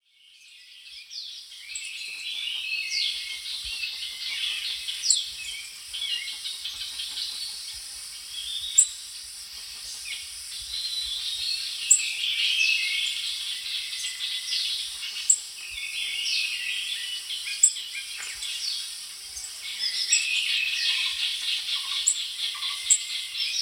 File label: pin tailed parrotfinch